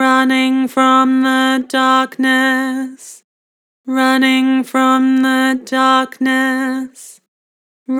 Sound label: Vibrato, Vocals; Harmor Vocals